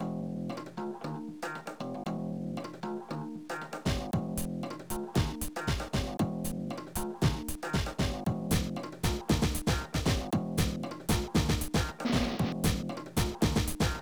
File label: bass party 120BPM